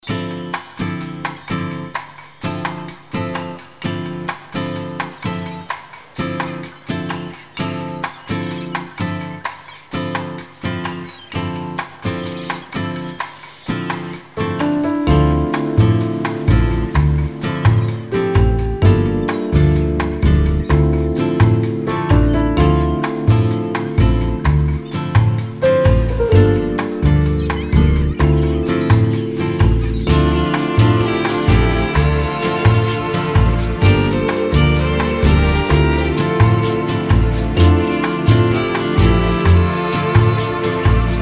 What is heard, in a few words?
Electro Blues Lounge Version